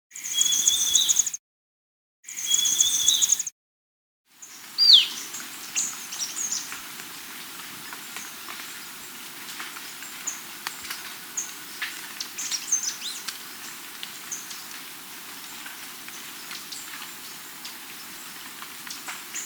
Bolivian Tyrannulet (Zimmerius Bolivianus), Andean Birds; S. America